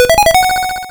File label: Red Coin